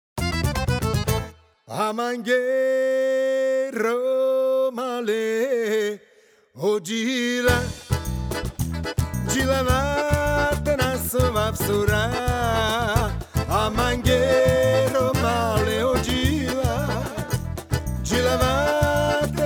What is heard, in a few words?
Fusion